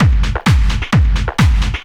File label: drum 130 techno; Techno Trance Essentials